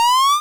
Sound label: jump, Retro Sounds 32-Bit